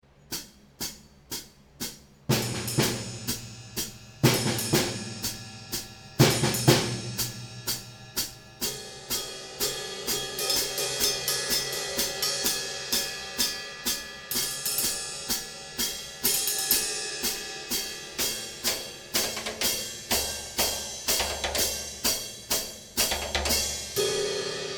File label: cymbals